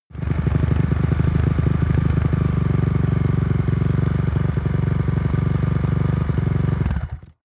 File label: motorcycle motorcross idle hot engine turn off